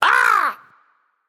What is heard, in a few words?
surprised; Smite; baronsamedi